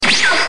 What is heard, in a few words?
instant transmission sound effect; dbz